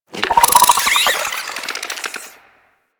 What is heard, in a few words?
Infested Weakpoint Vocal Chatter; Warframe